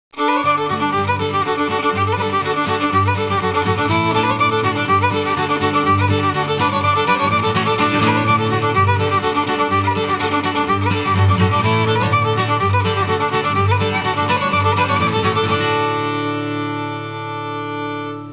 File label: suonerie musica traditional usa country; american